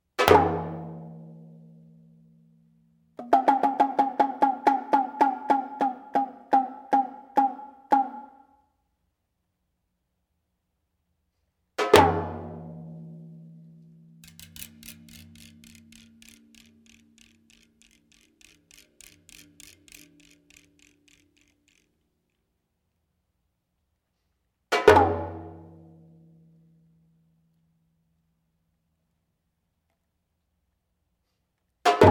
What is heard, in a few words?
Five Sounds, percussion